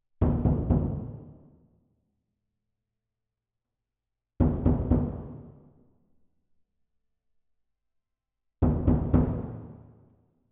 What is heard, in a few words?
Perc